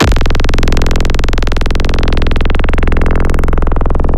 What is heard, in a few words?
One Shot BS Silver, synth; Synth One Shots; Synthetic's Serum Collection; Echo Sound Works Core Tables